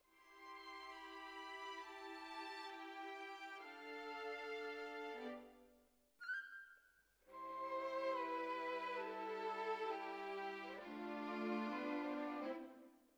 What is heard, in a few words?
Violins Over